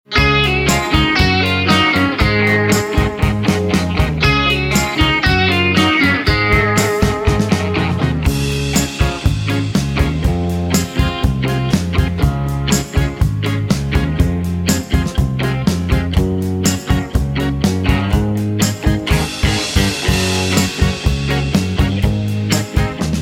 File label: (Db) No Backing Vocals